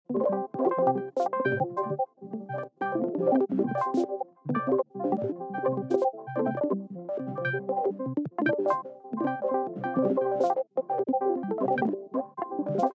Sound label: discord C; base pack